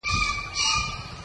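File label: green pheasant; キジ; オスは色だけでなく、鳴き声も羽ばたきも派手です。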